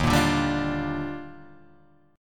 Eb Major 9th; strummed; EbM9

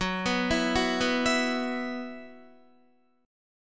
Gb 7th Suspended 4th Gb down Guitar, chord; Gb7sus4 arpeggiated

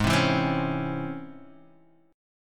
C sharp Minor Major 7th, chord

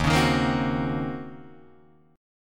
Eb Minor 13th Eb down Guitar DADGAD; Ebm13 strummed; JGuitar

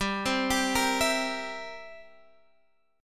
G 6th Suspended 4th Sharp 9th; G6sus4#9 arpeggiated

